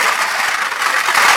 Scrape Strong

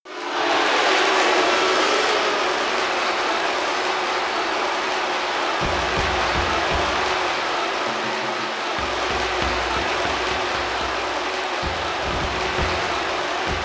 Spectator Chant; Heat of Eleven '98

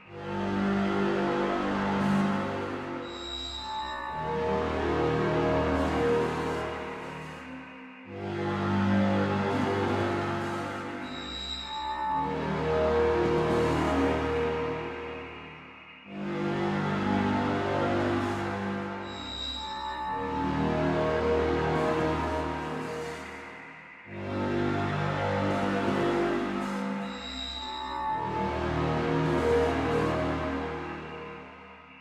music Hangar

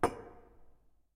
Colision Glasse

